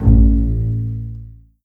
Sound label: A0 PIZ F BAS, string; East West; The Ultimate String Collection